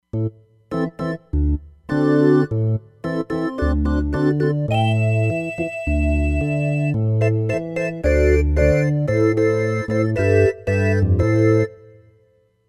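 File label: 60's organ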